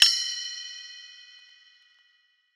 ride; eddyflux

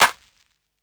clap; eddyflux; dough-samples

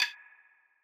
stick; eddyflux

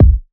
kick; eddyflux; crate bd; dough-samples

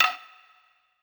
stick; eddyflux

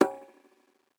conga; eddyflux; dough-samples

